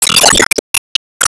Enemy Poisonwizard Apple Placement; disney star smash android ios mobile gamerip 2020

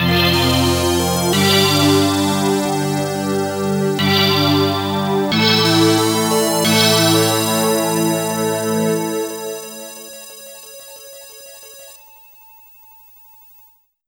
Round Clear; Cyberlip; Neo Geo CD

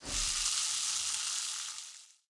Revive Reward; subway surfers city sound effects; Android, iOS

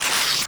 Paper Tear Up

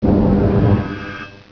Hart Die; Virus: The Game

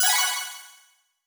Unlock Popup

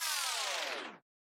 Ground Bot Small Death

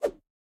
Swing Large

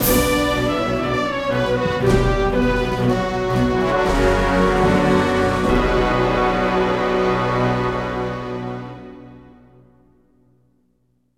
Combat Lose; Combat Reg5 Lose 02

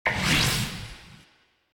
Pillpickup

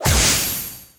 Gimmick Break

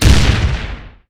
Woodblock Minion Active Hit; gamerip

